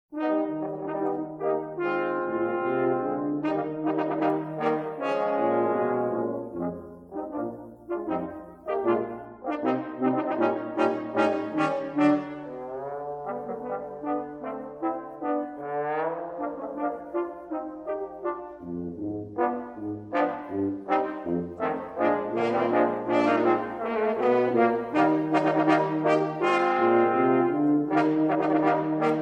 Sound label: trombone quartet